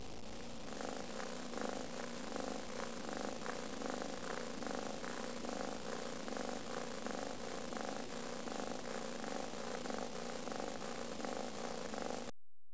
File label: CAT; animal sounds